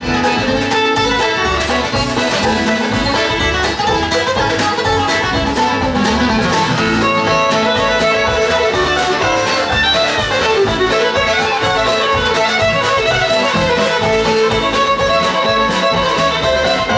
musicbox

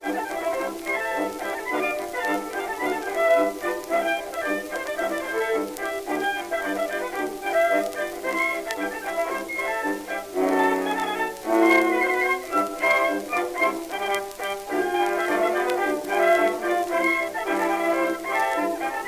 Jolly jingles, jukebox blues

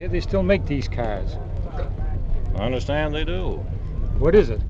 Automobile

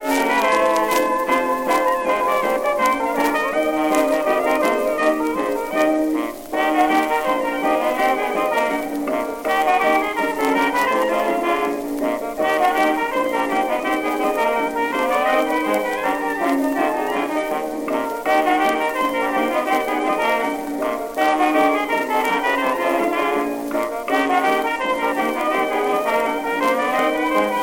jukebox blues

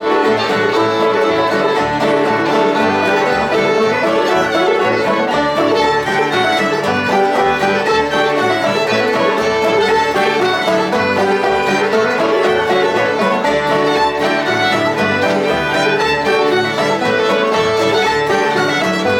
musicbox

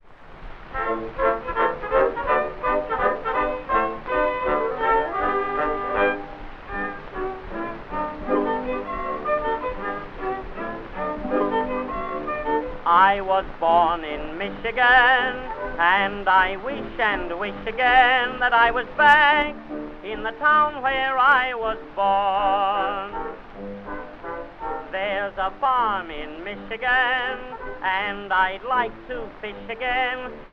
tenor and chorus orchestra accompaniment; NY Hippodrome

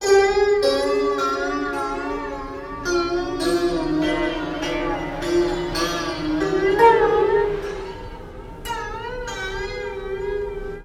Music unusual sounds

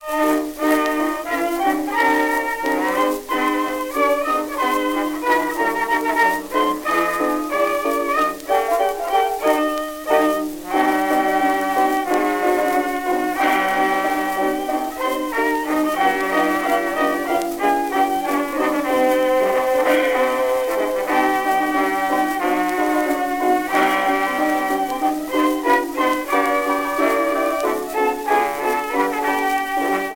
jazz, jukebox

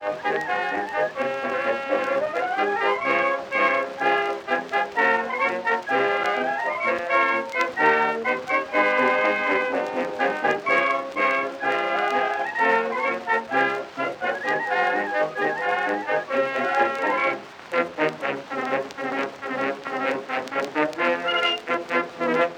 The US Field Artillery march; loc-edison

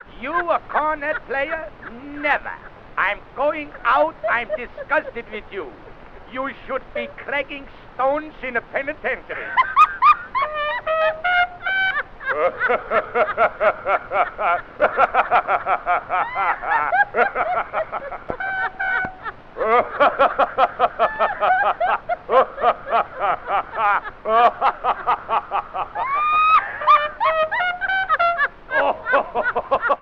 Laughing record

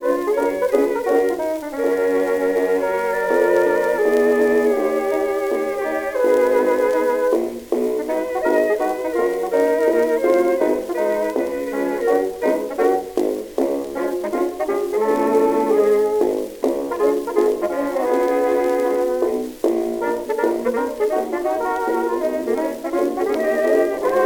jukebox blues

